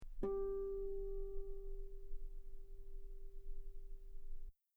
guitar G4 very long pianissimo harmonics; bananenkeyboard

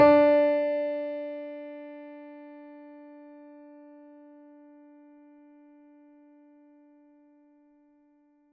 mini grand; bananenkeyboard

